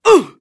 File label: Elder Exert, voiceovers; english